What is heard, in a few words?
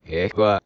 swordman ack; megaglest